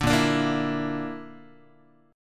A sharp Major Flat 5th down Guitar; strummed

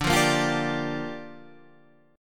Bb 6th D

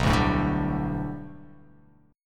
B Minor 7th Sharp 5th B, chord

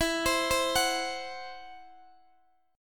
E Suspended 2nd Sharp 5th E, down, Guitar, DADGAD; Esus2#5; arpeggiated

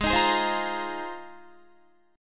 G sharp 6th Add 9th, Guitar; G#6add9; strummed; 16,18,18,17,17,17; 4E,4A,5D,5G,5C,6F

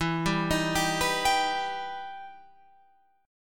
E Minor Major 7th, Guitar, Open F; 16,14,15,14,14,14; arpeggiated; JGuitar

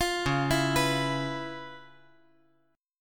Db 7th Sharp 9th Db, down

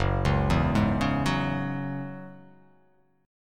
F sharp 7th Suspended 2nd; 2,4,2,1,1,1,x,x

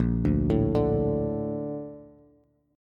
Bb Major 7th, Bb, down, Bass; BbM7 arpeggiated; JGuitar

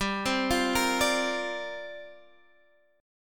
C 9th Suspended 4th G

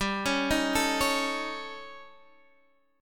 A 7th Flat 5th G

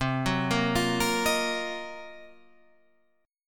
Eb Minor 6th Add 9th C

